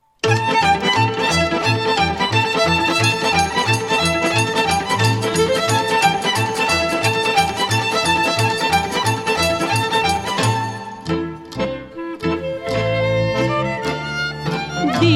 Hora si Sirba Dobrogeana, Roumanie

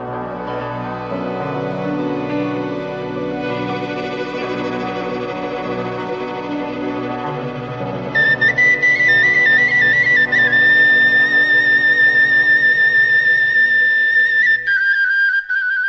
Suita de jocuri din Marginimea Sibiului - Roumanie

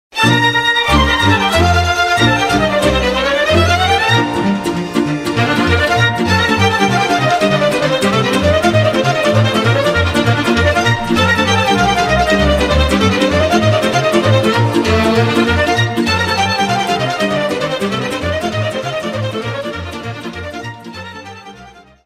Sirba, Roumanie; Sirba Schiopanarilor

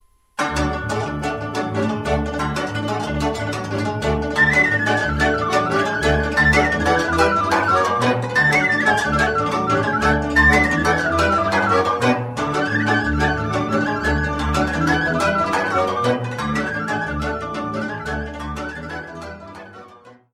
Briul, Roumanie

